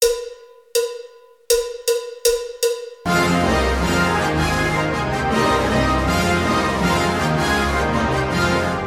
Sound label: Spanish Dance